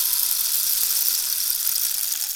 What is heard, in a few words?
African Rain Stick Pou